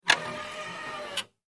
Robotic Arm Movement, robots

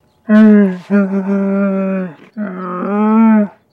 Camel Lng Moan; camels

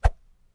Baseball Arm Cloth W; baseball fx & games

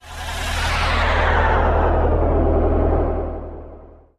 Data Scan Descending

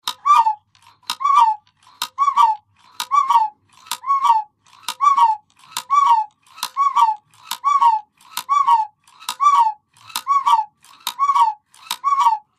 Cuckoo Strikes 12, cuckoo clock

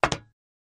FS Loafer On Metal, singles metal

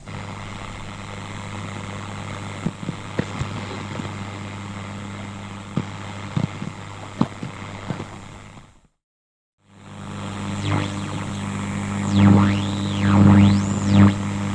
Radio Tuner Am Tuning, static

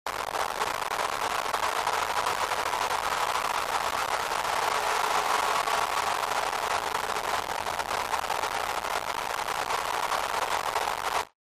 Noise Distorted Clicking Rip

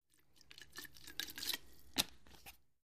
Fill Water Bottle

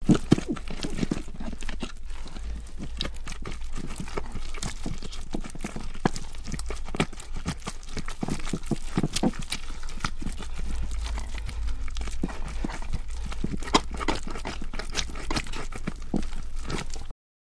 Flesh Mangle, gore stabs

